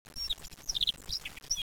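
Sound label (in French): bruant fou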